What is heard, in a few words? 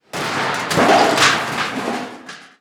explosive objects; World of Tanks